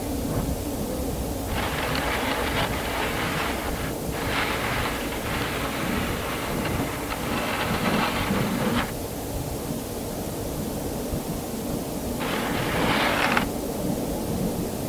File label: furniture moving, Nest